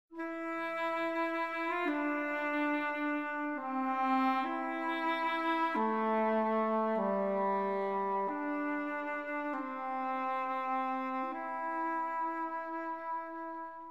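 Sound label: melody; Myst III Exile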